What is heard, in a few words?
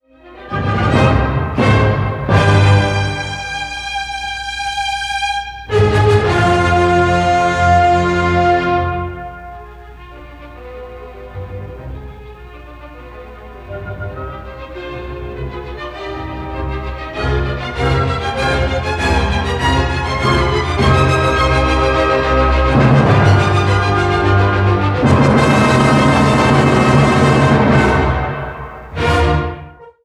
Symphony